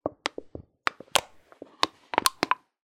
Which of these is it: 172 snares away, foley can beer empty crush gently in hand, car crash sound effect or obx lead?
foley can beer empty crush gently in hand